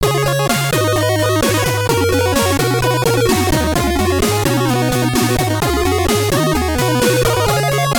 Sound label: ending; dracula